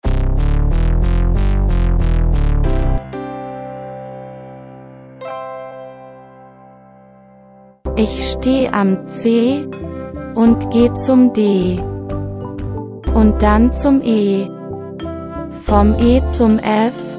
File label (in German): Tonleiter